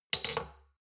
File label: shot glasses pull out medium, gastronomie; soundpool